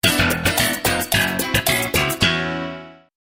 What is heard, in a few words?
Sitcom Bass Riff